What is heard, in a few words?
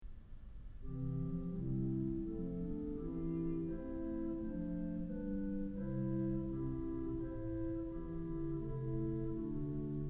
Rohrflute, organ stops; Kellogg Auditorium